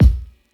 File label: kick; eddyflux; crate bd; dough-samples